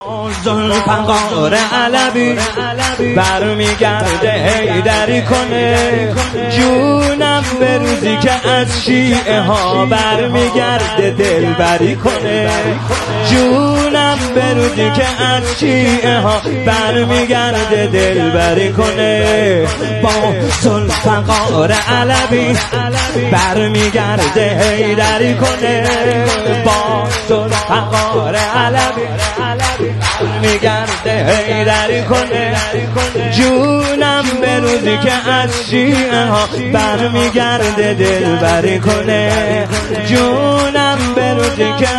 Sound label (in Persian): مولودی